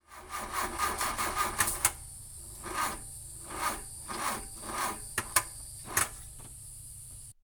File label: car not engine